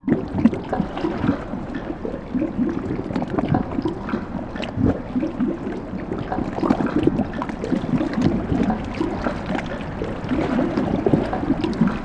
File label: magma; Seer; GamigoNA